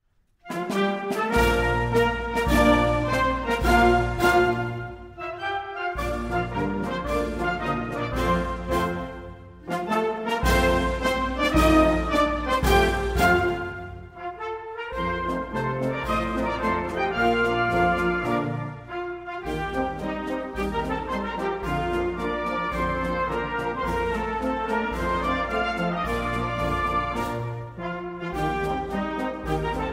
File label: Portuguese Band